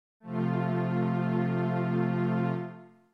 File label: F F Major